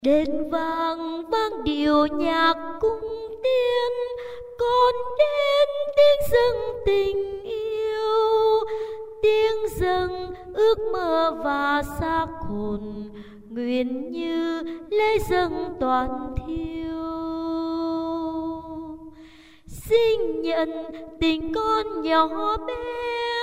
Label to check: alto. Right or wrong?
Right